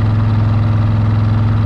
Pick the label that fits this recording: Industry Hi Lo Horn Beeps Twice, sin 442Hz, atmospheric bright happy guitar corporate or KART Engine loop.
KART Engine loop